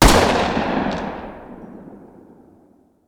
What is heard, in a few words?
fire 45 dist, uzi; weapons; arccw ud